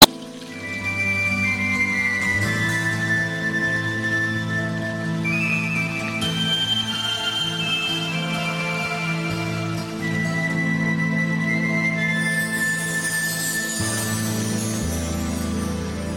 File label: flute; krishna ringtone